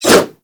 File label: Dagger Heavy Whoosh; Warframe